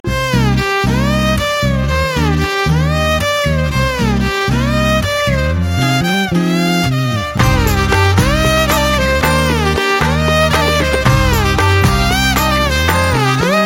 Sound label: BG Violin Tone